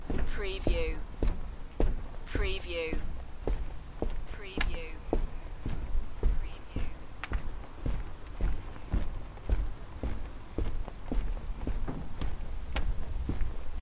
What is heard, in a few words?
Footsteps over a wooden bridge; beanstalk audio